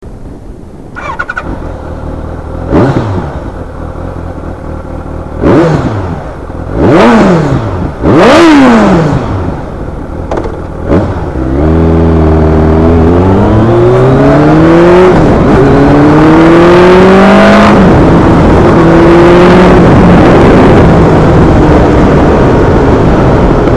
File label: muffler sound, zzr1200